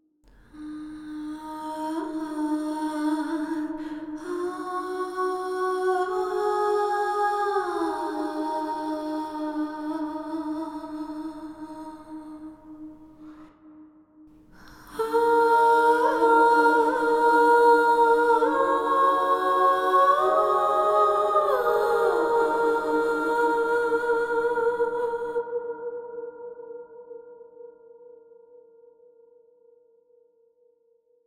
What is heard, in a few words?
Quiet Female Vocal